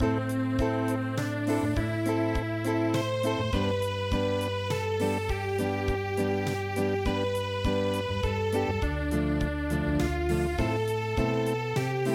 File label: pear tree Unplugged